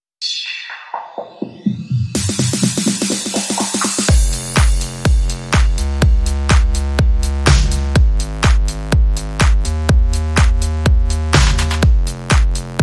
Beat and Bass Mix

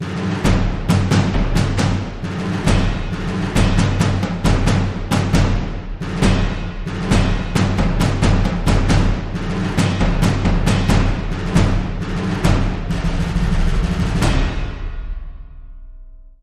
Battle Drums

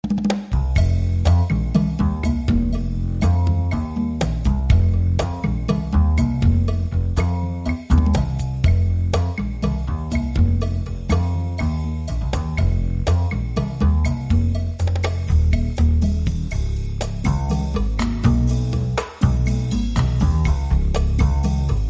No Melody

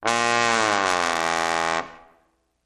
Trombone Low Gliss Down

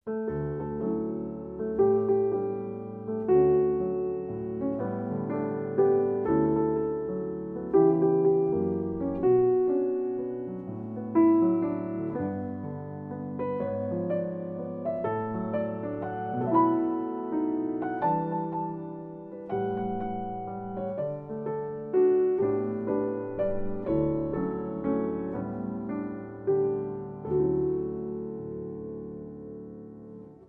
Solo Piano

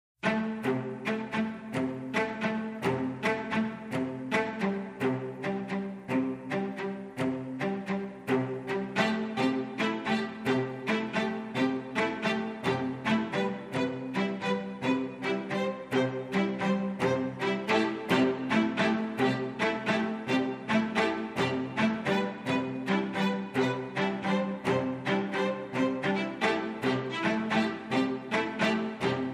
Strings

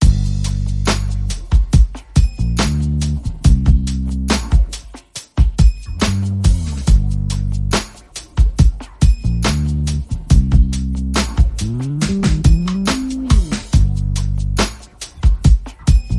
Drum and Bass

